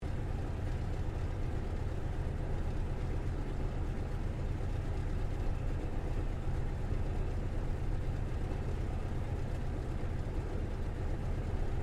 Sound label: AIRCRAFT CA-28 CERES IDLE INTERIOR; Airplanes; Vehicles